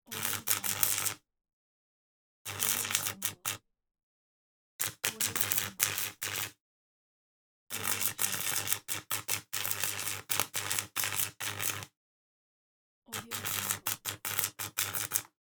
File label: arc welder